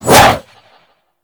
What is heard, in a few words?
Arch Wing Sword Short Whoosh; Warframe